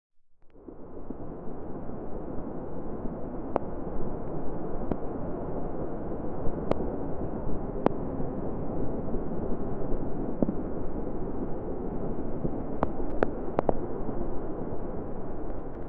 imagine a post industrial, post apocalyptic

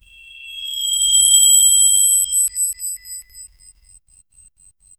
airy bell motif, reverse swell

